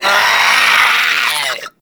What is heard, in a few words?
Infested Runner Attack; Warframe; Runners